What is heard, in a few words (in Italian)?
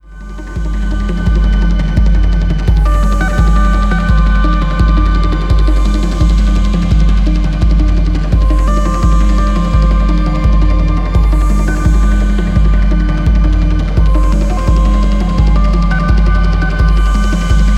Flauto Synthetico